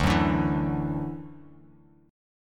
D-Major Add 9th D down; strummed; JGuitar

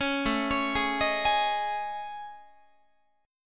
A Major Sharp 11th, Guitar; AM#11 arpeggiated

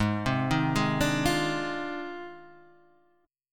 F Minor 13th, G sharp

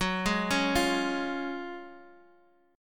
Gb Major 7th Suspended 2nd, down, Guitar; GbM7sus2 arpeggiated

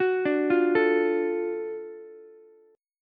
Gb Minor Major 7th Sharp 5th, Ukulele, chord; arpeggiated; 11,14,13,12; JGuitar

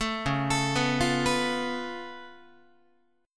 Db Augmented 7th Db down Guitar; arpeggiated; JGuitar

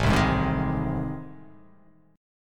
A Minor Major 7th Flat 5th

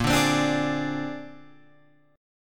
Bb 9th Bb Guitar Open F, chord; 10,9,12,9,11,9; strummed

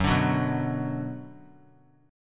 Gb Suspended 4th Sharp 5th Gb, down; strummed; JGuitar

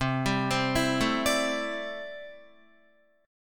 C 6th Add 9th C down Guitar; C6add9 arpeggiated

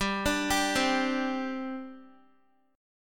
G Suspended 4th, Guitar; Gsus4 arpeggiated; x,10,12,12,12,x

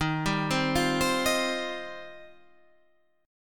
Eb 6th Add 9th, Guitar Standard; arpeggiated; 11,10,10,10,13,11

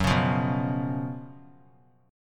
Gb Major 7th Suspended 4th Gb; GbM7sus4; strummed; JGuitar

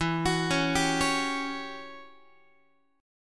E Minor 6th Add 9th guitar chord; Em6add9 arpeggiated; JGuitar

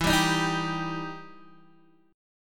E Minor Major 7th E down Guitar DADGAD

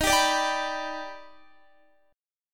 Eb Augmented Major 7th Eb down Guitar; Eb+M7 strummed; JGuitar

